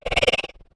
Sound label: spider die